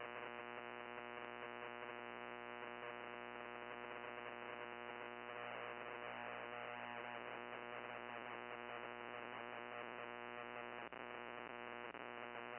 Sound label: powerline noise 5MHz; PGE